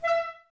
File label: wind clarinet, Clarinets, Wood Winds; Miroslav Vitous - Woodwind Brass Ensemble; 90's Sample CDs